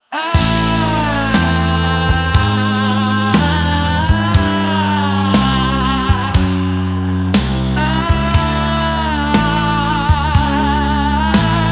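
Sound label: Male, Pop ballad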